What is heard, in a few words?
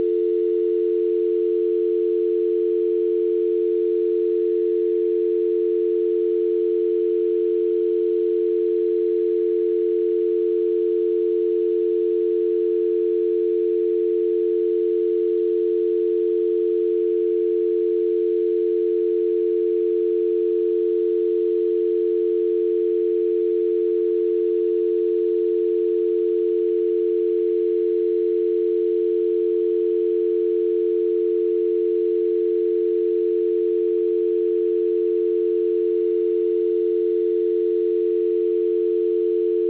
dial; Telsa Recorder Server; ivr